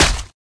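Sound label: attack normal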